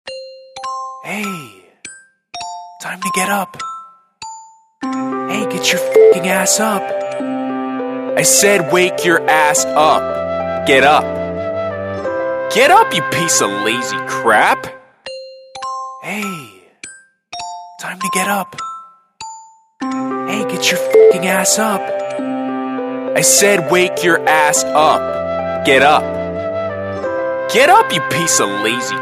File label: Funny Alarm